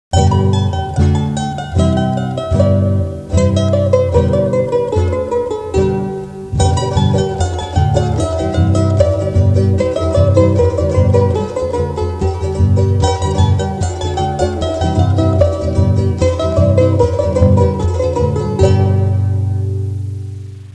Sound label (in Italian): musica strumentale